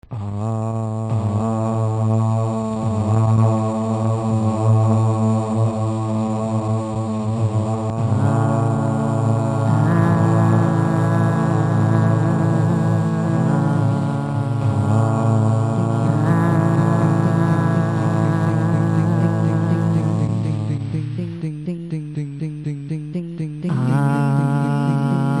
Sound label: aaaah